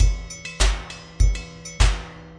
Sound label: 100 bpm pile of junk sparse, drum loops and breaks; 091 to 100 bpm